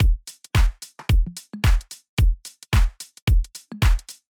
Drum Beat With One Top Loop Unmixed, pop rap

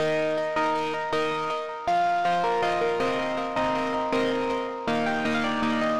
Keys Part, hyperpop beat

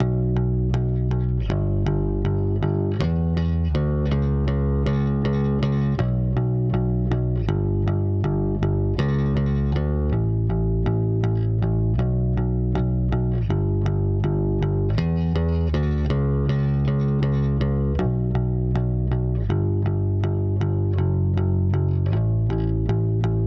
Clean Bass, shoegaze

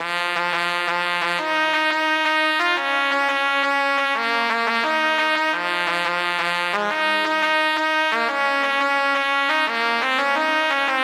Trumpet Melody, drum and bass

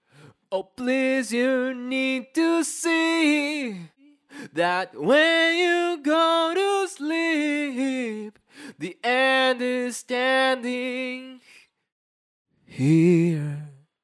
distortion tricks distorted

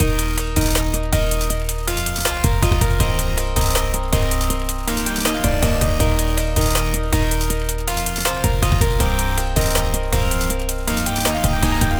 Full Beat, hyperpop beat

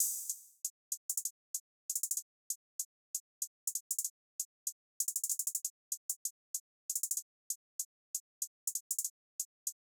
Hi Hat, modern hip hop

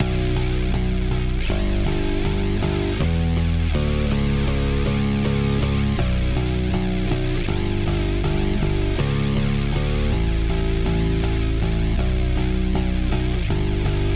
Bass All Together, shoegaze

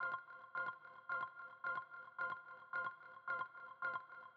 Mellotron Before Processing, pop rap

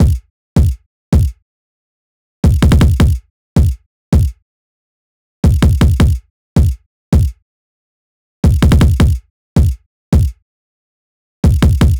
Kick Pattern, hyperpop beat

